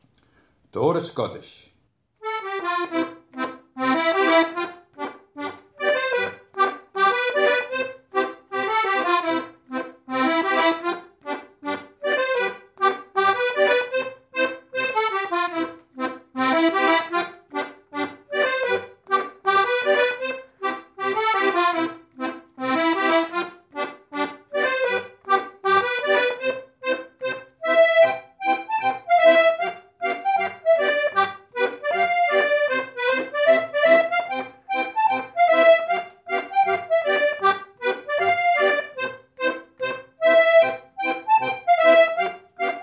tores schottish; schottisch

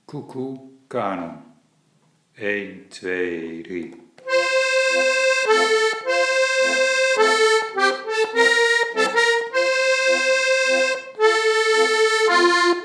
coucou canon

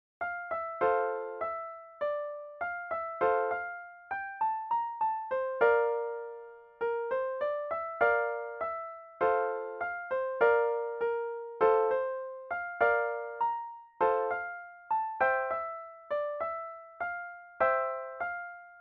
harmony in F

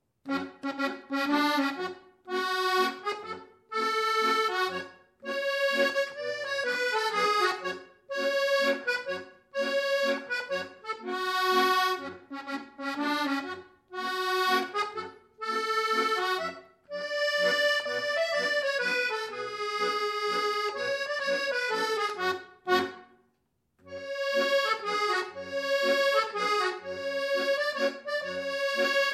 Mazurka

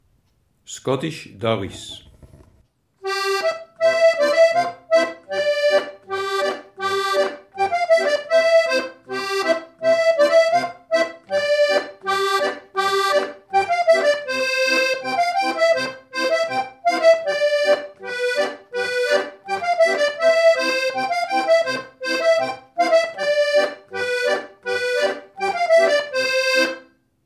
scottiche; d'aurice

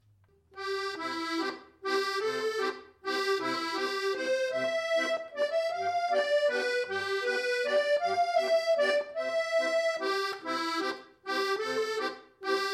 waltz; bill coopers waltz

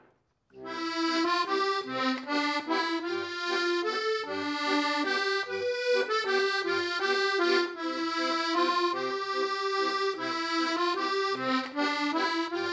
waltz; mudgee waltz

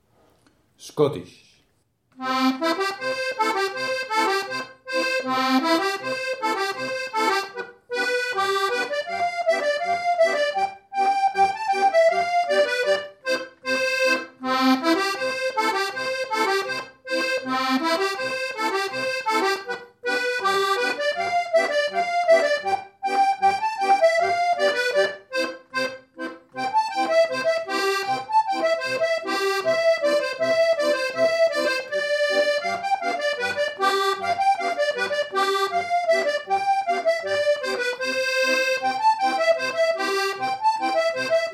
schottis

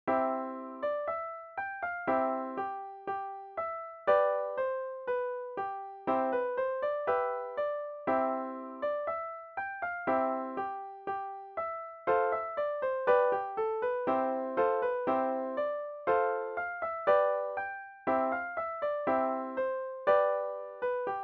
Polka C; Cottingham Polka

